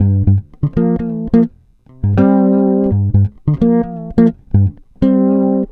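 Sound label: G minor, Bass, 84 BPM; Sampleheads; Ultra Freakin' Bass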